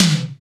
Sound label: TOM, KIT Dance Kit, Roland